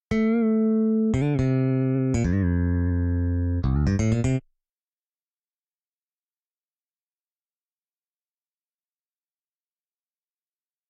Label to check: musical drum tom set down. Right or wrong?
Wrong